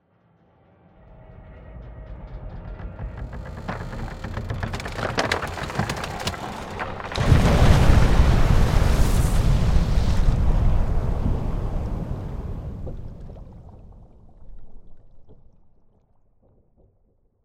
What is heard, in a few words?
Ship breaks free